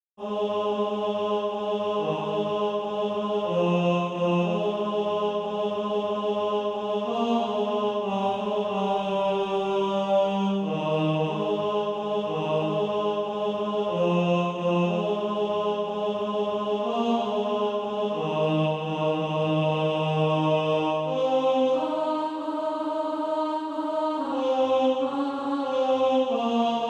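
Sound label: tenor, SATB Practise Music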